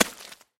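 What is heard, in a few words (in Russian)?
уверенный шаг в самую грязь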